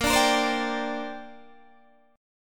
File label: Bb 6th Add 9th Bb Guitar DADGAD